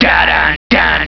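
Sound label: chain start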